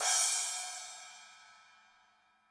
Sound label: splash, gretsch kit